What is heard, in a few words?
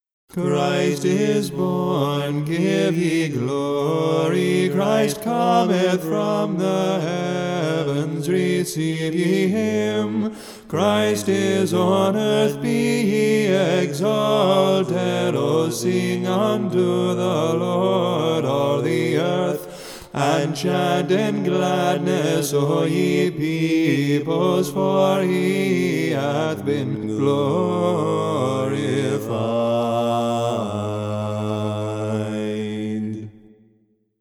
Heirmos Tone I; Christ Is Born; Ode I, Canon I